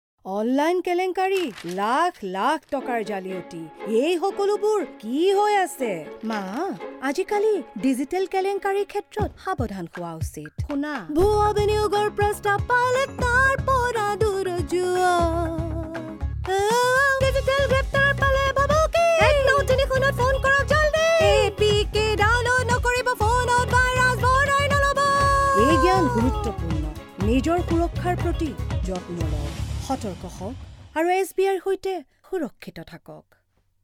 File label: Jingle; SBI